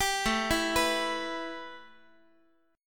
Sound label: A 7th Suspended 2nd, A, down, Guitar; A7sus2 arpeggiated